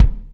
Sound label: Yamaha CS6, Drums